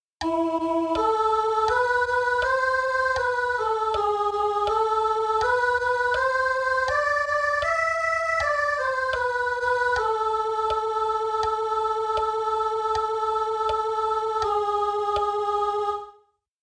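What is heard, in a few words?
Kyrie, sopranes; Taizé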